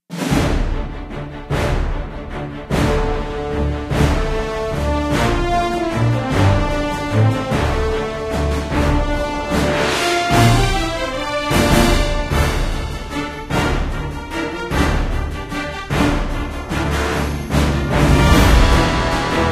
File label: Ship To Ship Combat 150bpm Fullmix